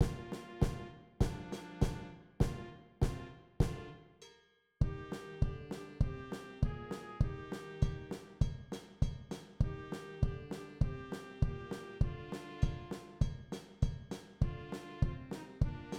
rekruttene gaar trommer

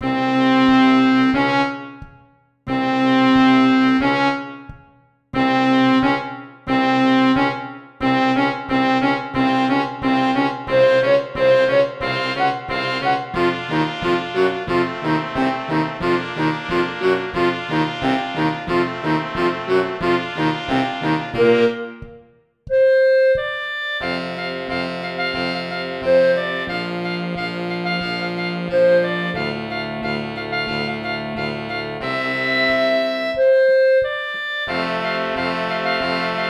alle oving, skolekorps; Borgertog2019

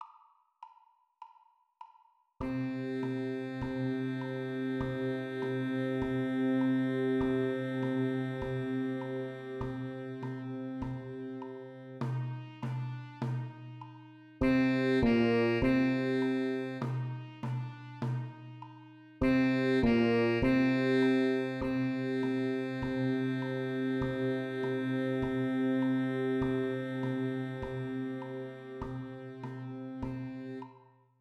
marsj lyd Bb2 Eb2, skolekorps

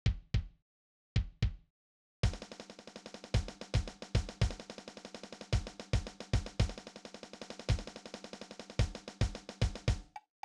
Tromme marsj

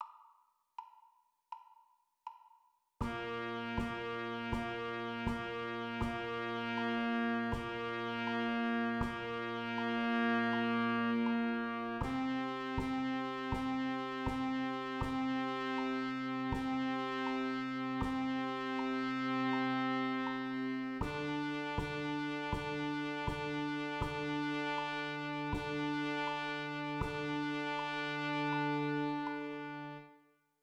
Oppvarming lyd alle, skolekorps

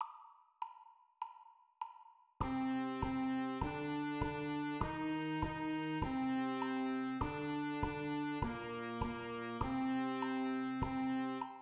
marsj lyd, skolekorps

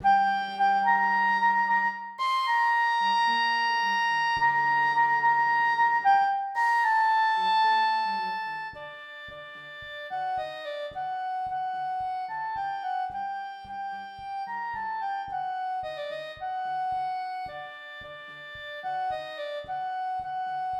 klarinett; HavahNagilah

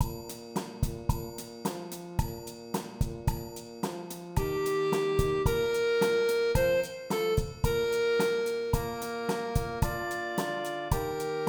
trommer

